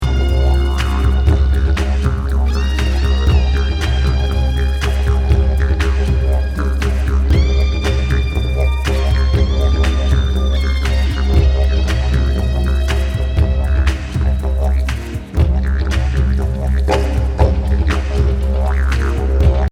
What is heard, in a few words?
Ensemble